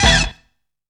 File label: FUNKICIZED, FUNKY SYNTHS; Zero-G; Funky Elements